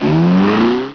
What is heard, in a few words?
Vroom; Sound Sculptor II 2.0